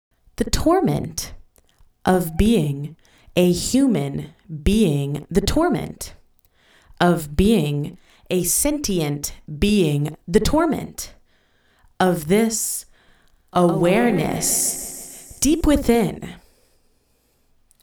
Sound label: vocals